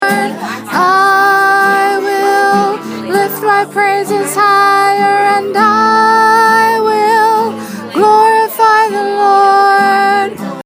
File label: OMG sop lift praises